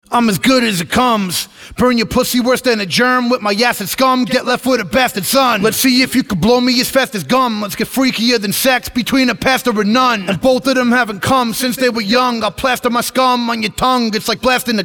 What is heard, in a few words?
acapella version